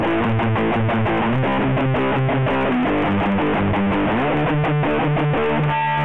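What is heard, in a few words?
Guitar A C G D Mute Riff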